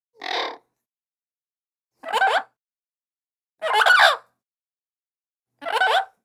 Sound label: Voice Parrot Source; Teemo; Shroom